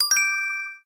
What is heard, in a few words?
Money Nyuukin; Pocket Academy 3